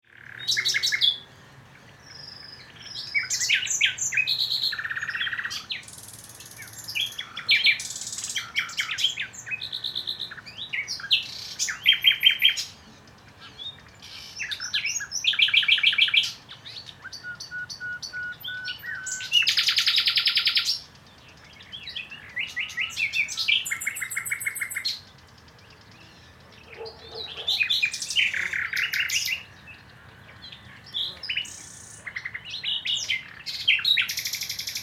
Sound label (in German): Hecken Orchester